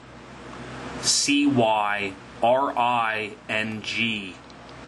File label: Cyring